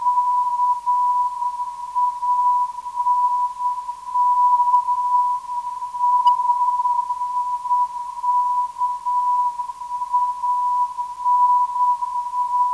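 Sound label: non-directional beacon; KAN; 356kHz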